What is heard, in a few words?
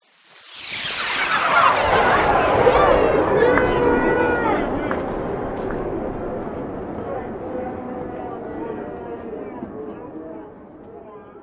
Sweep Slow; Created sweeps, swooshes and other movement effects; Sweepers; Sound Design; Foundation4